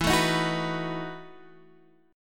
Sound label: E Major 7th Suspended 2nd Suspended 4th, Standard; EM7sus2sus4 strummed